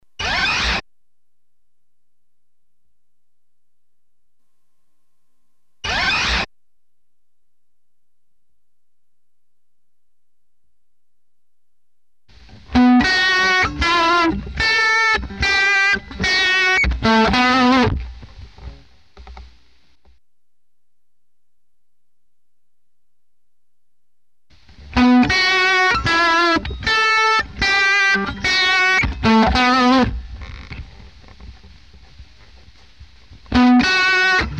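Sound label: electric guitar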